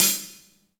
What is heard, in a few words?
XV Hat C, Roland XV-5080